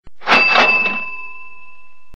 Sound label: cash register